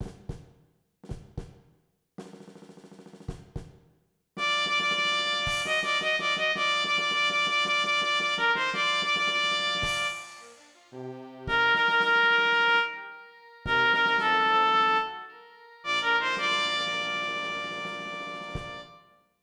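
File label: trumpet 2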